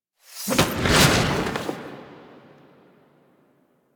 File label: Scythe Pull; Stalker; Warframe; Quests; The New War; Sealab; Hunhows Lair; Hunhows Lair Part One